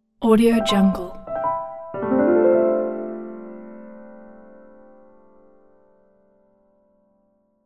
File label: piano logo